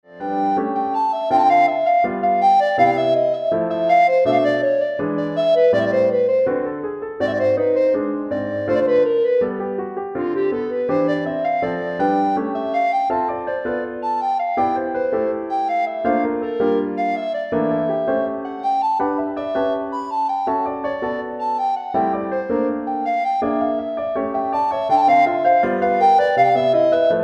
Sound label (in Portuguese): Chorinho